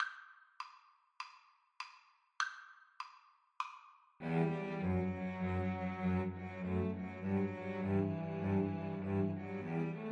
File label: Cello trio